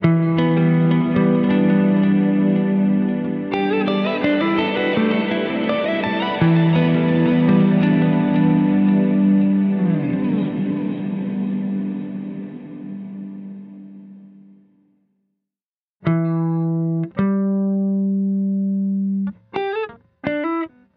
Guitar; Emin Dance; H90

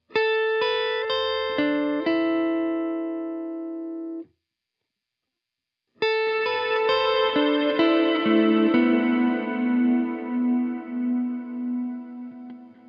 Feedbacker

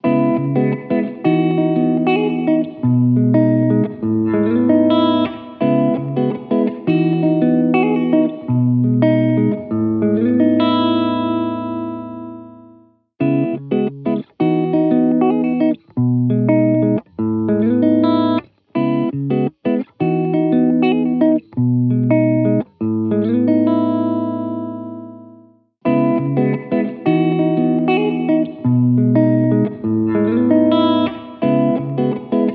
Guitar Reverb Bedroom; SP2016; H90

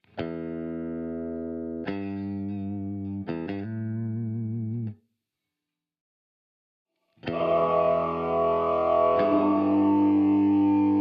Engine Starter

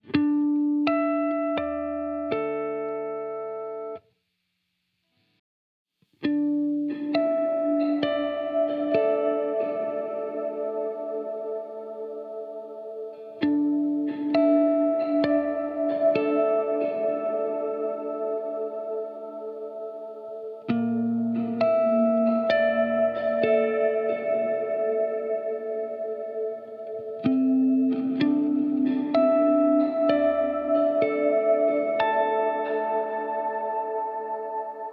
Plucked Echoes

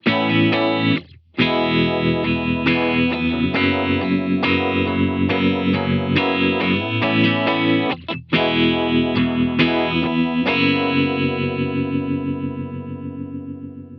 Guitar Even Vibe Slowly Drifting; H90

